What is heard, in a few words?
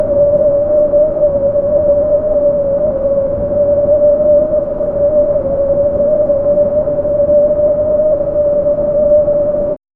high altitude wind whistl; glimmering